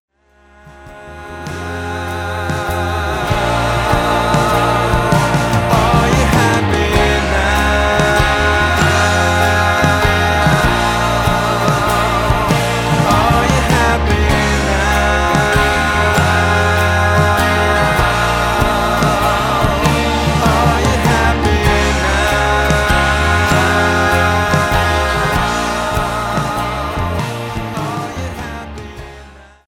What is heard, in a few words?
Vocals Drums Percussion Keyboards; Guitars; Bass